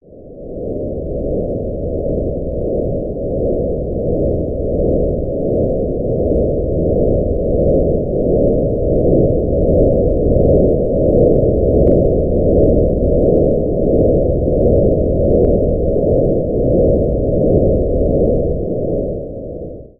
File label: blackbird interior sound; chrono trigger; ff3; ff3us